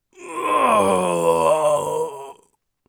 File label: death, Warrior Voice; Warrior Voice Pack